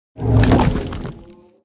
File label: turtle